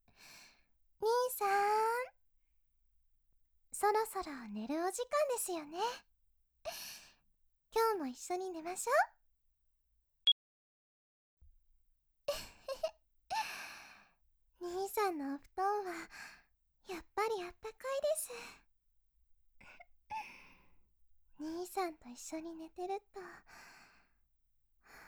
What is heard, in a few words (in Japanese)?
おやすみのキスしましょ; 兄さん、おやすみのキスしましょ♪